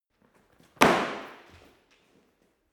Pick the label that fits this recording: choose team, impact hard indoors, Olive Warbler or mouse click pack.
impact hard indoors